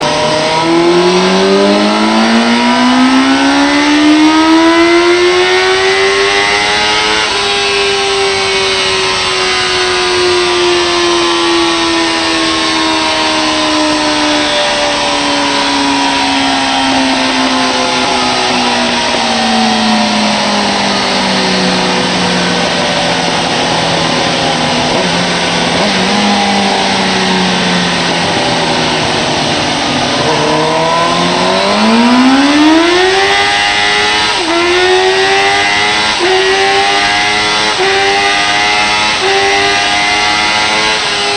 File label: Suzuki GSXR750; 1998; yosh